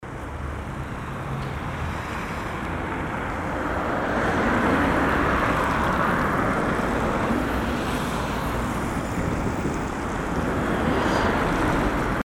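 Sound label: sound of road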